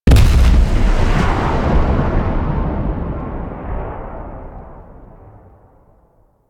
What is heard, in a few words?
Obelisk Orbital Strike Launch; Warframe